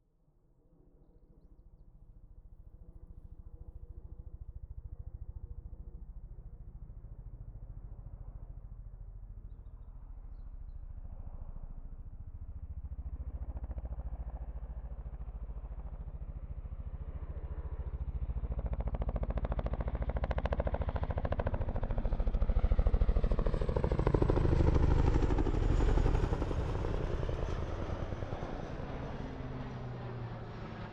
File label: Chinook Jet